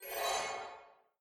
Skill Haste

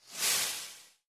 Skill Cancel Invisibility

